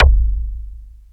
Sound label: MACUMBA, PERCUSSION